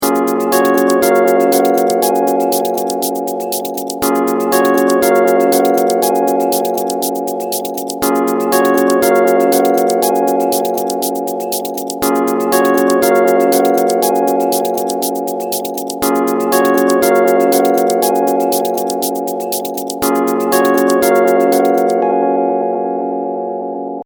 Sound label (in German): klingelton